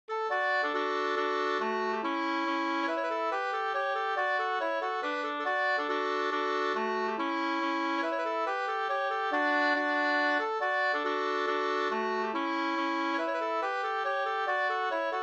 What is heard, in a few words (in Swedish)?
mazurka, 2st